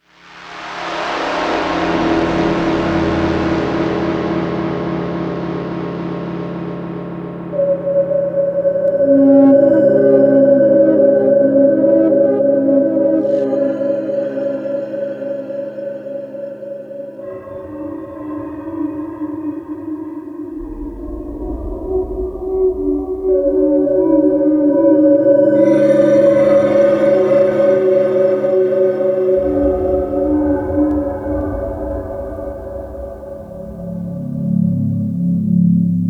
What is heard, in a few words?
Underground Ambience; Spelunking; The Legend of Mata Nui; Game Music; Console Games